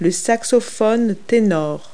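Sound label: tenor saxophone; Multi-Lingual Talking Picture Dictionary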